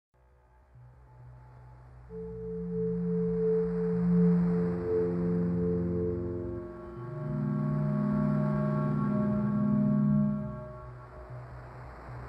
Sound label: (Bm) With Lead Guitar